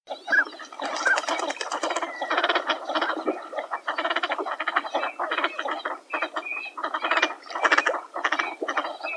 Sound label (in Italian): Codone